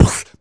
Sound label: Beatbox Snare, Human Beatbox